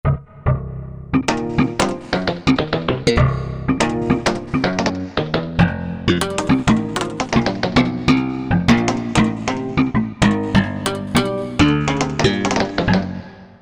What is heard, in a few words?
Percussion